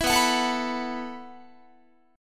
B 7th Suspended 4th B down Guitar

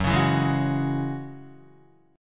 Gb 7th Suspended 2nd, Guitar, Gb7sus2 chord; strummed; JGuitar

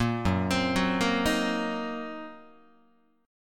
F sharp 6th Flat 5th F sharp down Guitar; arpeggiated; JGuitar

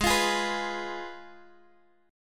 G sharp Minor 6th, Guitar Open F; strummed; x,15,17,15,14,x; G#m6

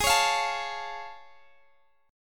A Augmented Major 7th G sharp

